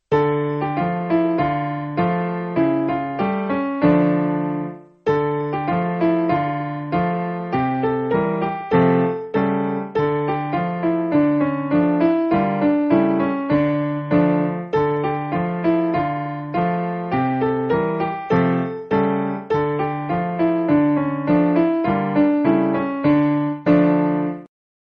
Folk National

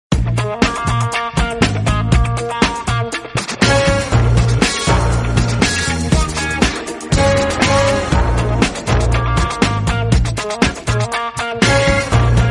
laid back shuffle

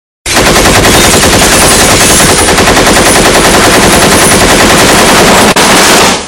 Gun Ak-47

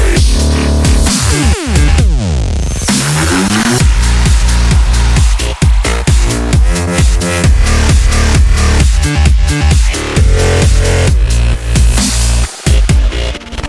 Electro Lightning

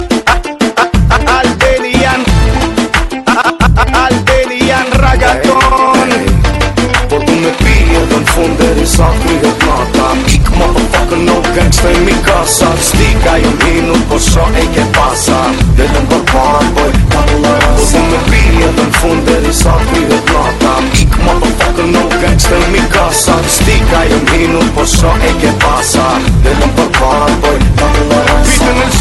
Albanian Reggaeton

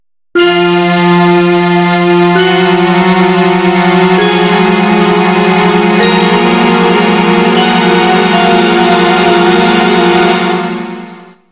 Tension

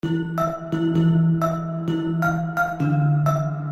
Xylophone

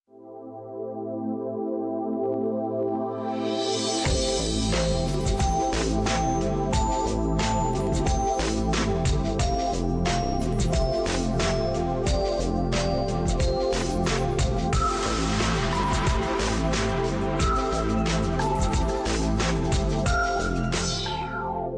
Sweet Tone Shine

